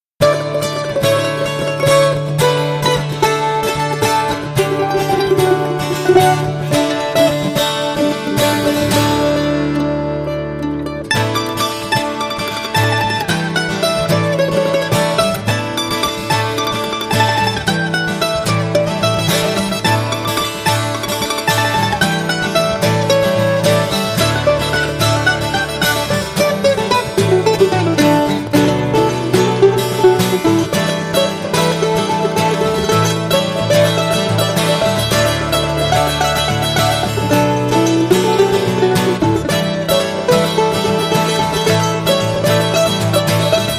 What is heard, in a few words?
Mandolin Blues